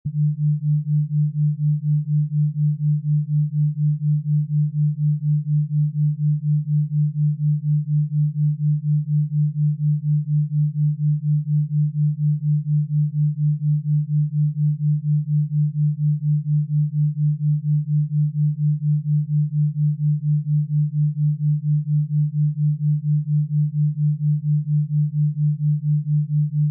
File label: deep sleep alpha waves